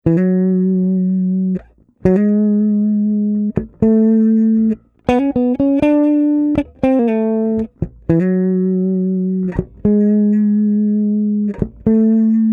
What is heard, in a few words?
LEAD ONLY